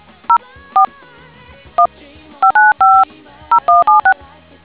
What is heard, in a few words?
dtmf